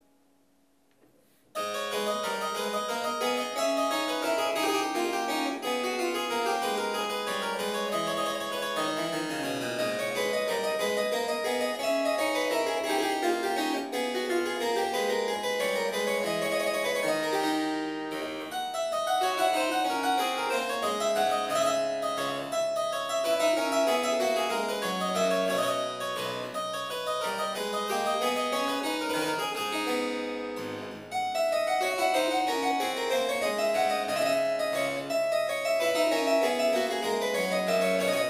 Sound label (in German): Menuet a Moll; Rameau; zusammengeklebt